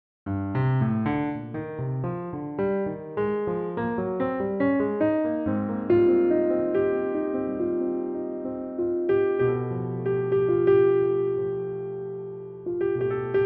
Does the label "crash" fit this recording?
No